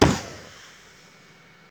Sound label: Explosion